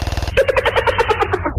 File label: sfx for roblox intro